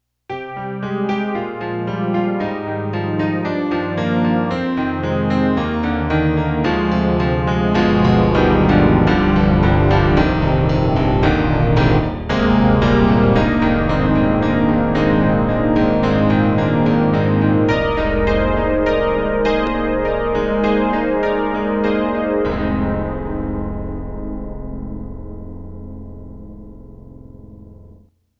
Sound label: Korg NX5R Piano; PianoHaven